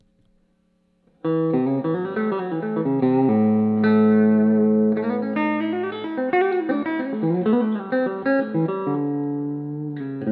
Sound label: fat man tele bridge